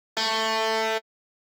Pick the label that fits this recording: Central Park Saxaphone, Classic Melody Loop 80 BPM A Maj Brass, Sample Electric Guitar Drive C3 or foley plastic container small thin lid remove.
Sample Electric Guitar Drive C3